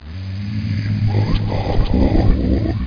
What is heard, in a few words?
MUMBLE